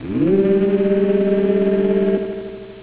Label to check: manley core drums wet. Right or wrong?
Wrong